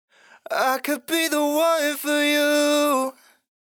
Sung Phrase Dry, 128 BPM A Min, vocal; The One; Cymatics; Euphoria Vocal Sample Pack

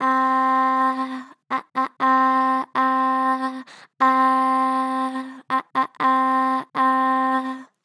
Str Flat Ah Ah, Vocals, Pitch Flat Strong; Harmor Vocals

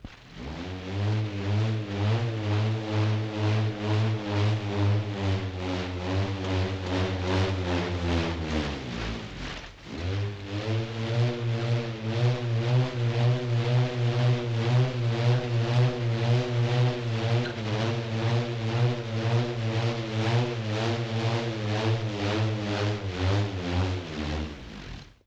LoFi Motor Whir Down; Vintage Cartoon; Foley

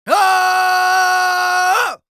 Yells, Viking Vocal

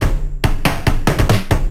Riddim Drum Fill 140

